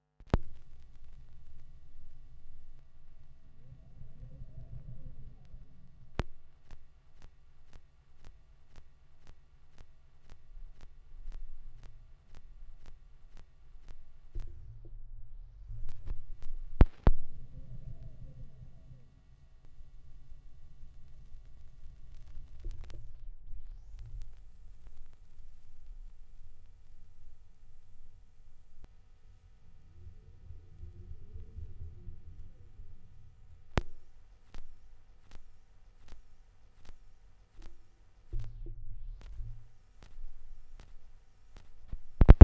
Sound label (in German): schallplattengeraeusche, Vinyl; wendelrekords 02